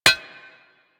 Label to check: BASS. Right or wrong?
Wrong